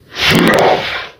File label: overlord wizard attack; svencoop; custom monsters